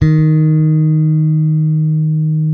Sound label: bass, sustain; Sampleheads; Will Lee Bass Library